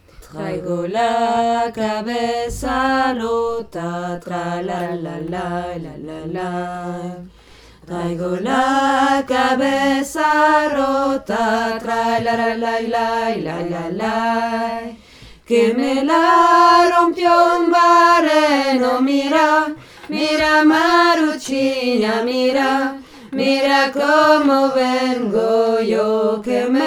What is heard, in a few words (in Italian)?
En El Pozo alti